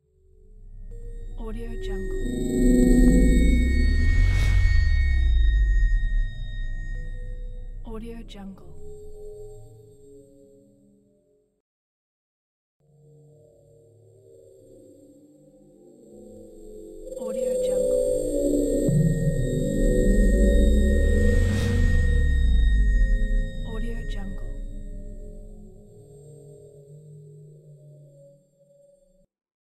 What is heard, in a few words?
transition ambiance futuristic scifi